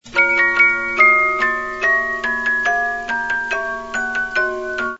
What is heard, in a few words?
72-Note Movements, music box; Dance of the Sugar Plum Fairy